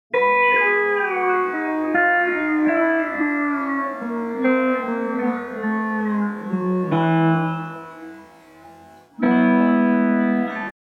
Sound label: quarter speed